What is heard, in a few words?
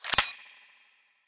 perc; eddyflux